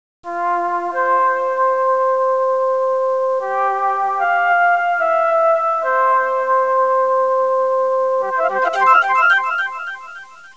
audio suonerie musica mistica

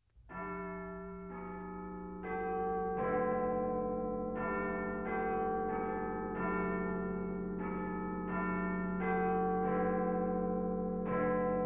BIGBEN OROLOGIO LONDRA

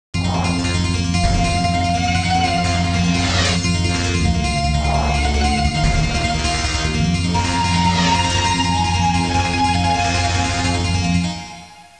musica elettronica; electronic

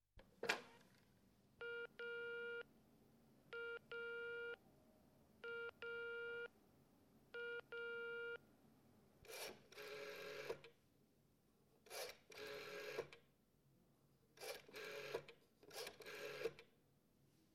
TELOFONO A RULLA; phone

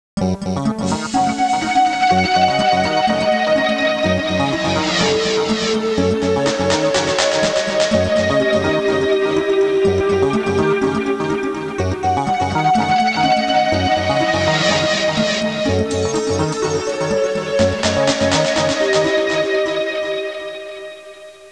musica elettronica; Suoneria

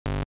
suoneria botton; beep

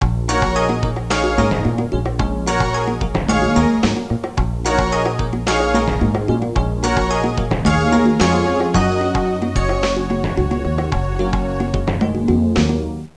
suonerie contemporanea; musica contemporary

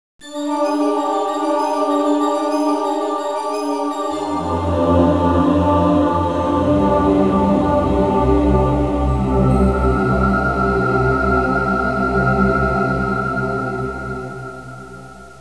audio suonerie musica mistica

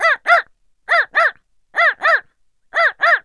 uccelli birds, animali

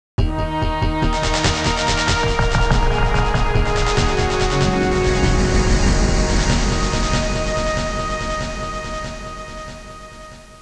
musica elettronica; electronic

